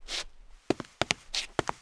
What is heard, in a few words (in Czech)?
zdrhá; Mafie 1